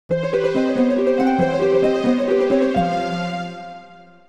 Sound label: triplets; AWE64